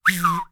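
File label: WHISTLE, ANDES; World Voices; ANDES FX; Spectrasonics Vocal Planet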